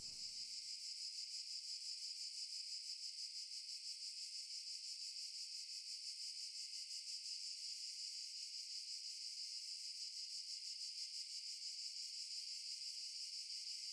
cicada; underbrush

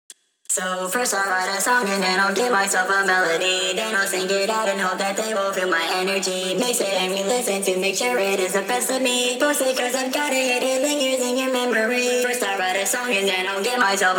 vocals

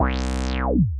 synth

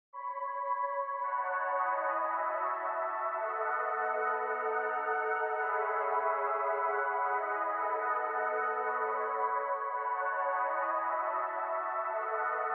atmo Chords

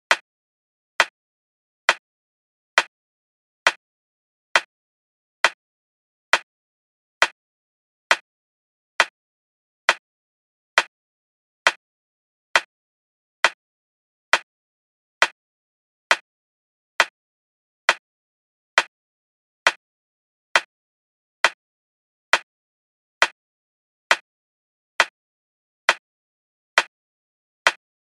rim snare

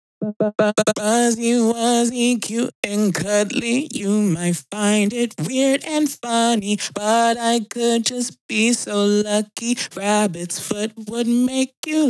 vocals verse

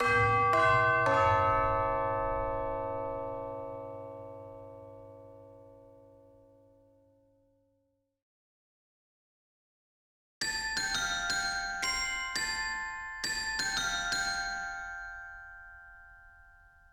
bells

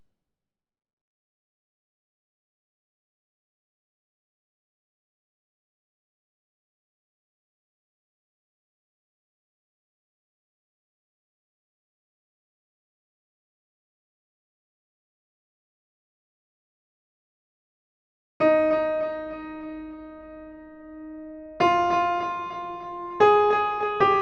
piano

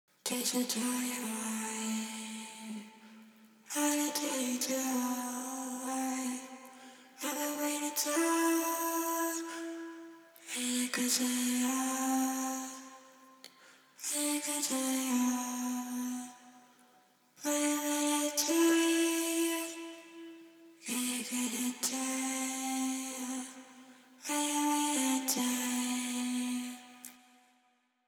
vox

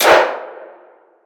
clap poof

